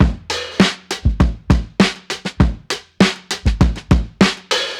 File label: fat hip hop 100